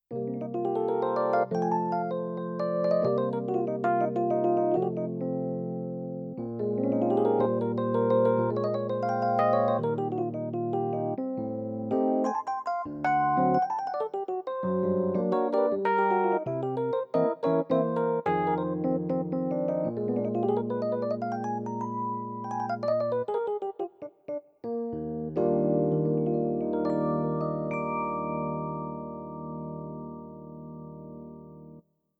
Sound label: elec piano